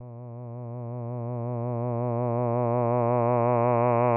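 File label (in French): A, un "a" d'une voix de basse, variation de la pente spectrale TL de 40 à 0, avec vibrato; sans vibrato; AteliersHenrich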